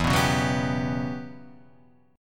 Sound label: E Minor 6th Add 9th chord